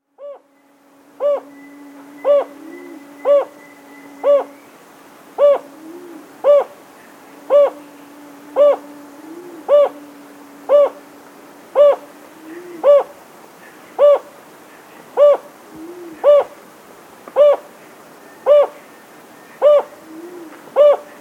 Jocotoco Antpitta; S. America

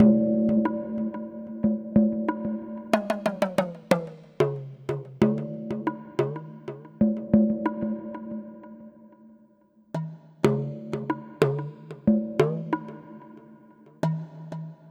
Minimal Planet Drums; Ambient Cinematic

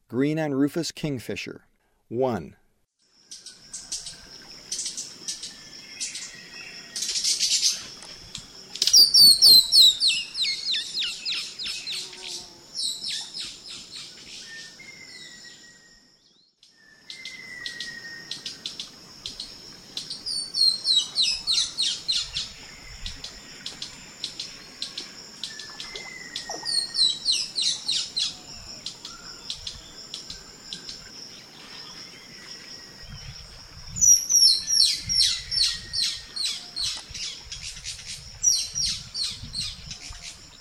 Green-and-Rufous Kingfisher; S. America; Eastern Lowlands

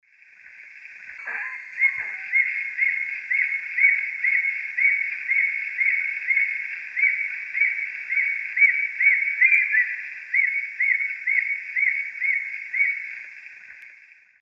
Morphnus guianensis, flight calls; S. America; Eastern Lowlands

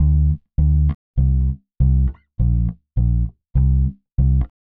Jazz bass, Rock Bass

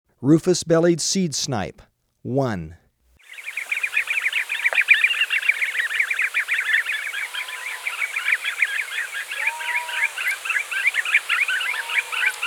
Rufous-Bellied Seed Snipe, Birds of Ecuador; S. America

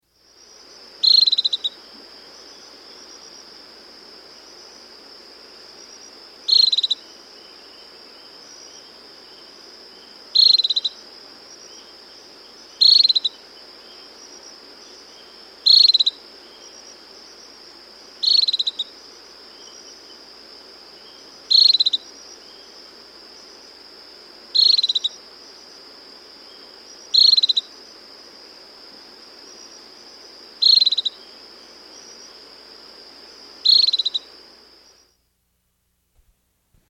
Tropical Pewee; S. America

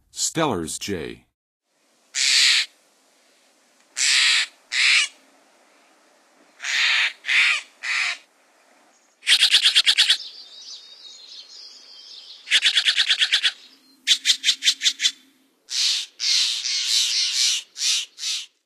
Stellers Jay, Songs of Birds; North America; Western USA; Western USA Songbirds

